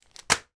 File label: household cd or dvd in paper wallet put down on desk